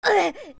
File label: Young Fall Down; Link getting knocked to the ground; OOT